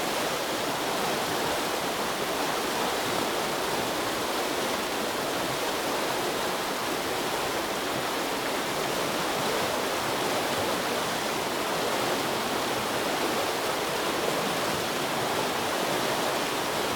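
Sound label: Scottish farm aqueduct; the 39 steps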